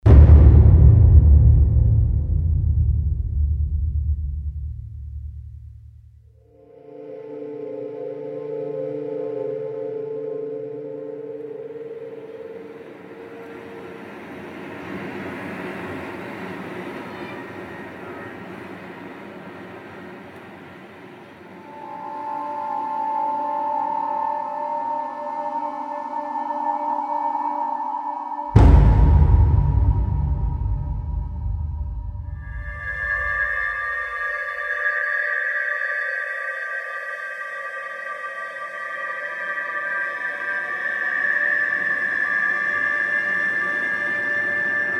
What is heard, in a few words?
burned village amb 120bpm